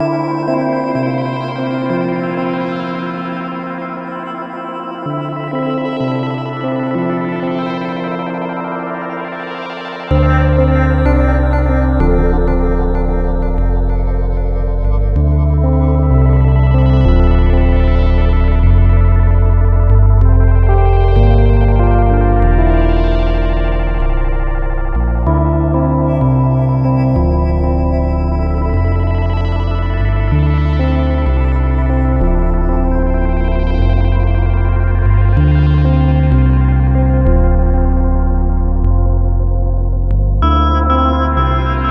music bar; freelancer-hd-edition

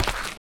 step dirt, footsteps; veloren

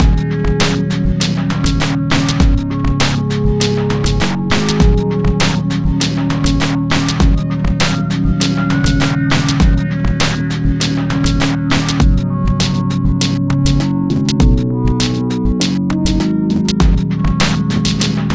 music bar; freelancer-hd-edition

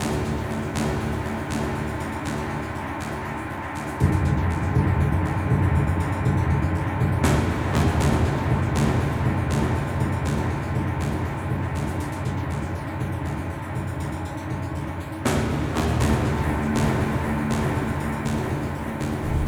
music danger; freelancer-hd-edition

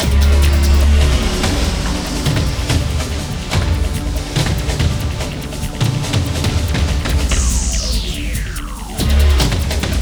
music battle; freelancer-hd-edition

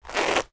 zip out; zipper sounds for opening backpacks; ACE3; Arma3

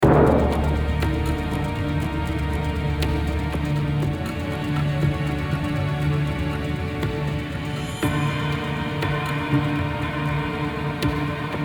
music reveal challenging

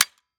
weapon rest unrest; weapon resting; ACE3; Arma3; Added weapon resting & weapon jam sounds

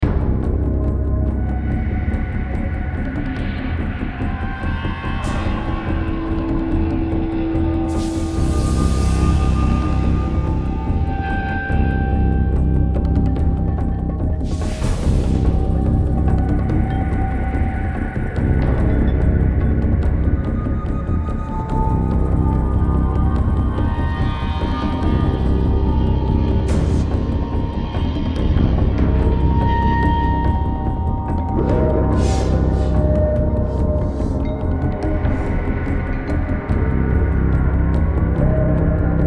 music danger; freelancer-hd-edition

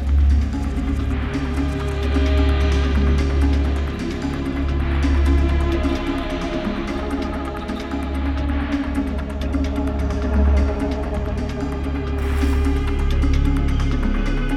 music danger; freelancer-hd-edition; omega

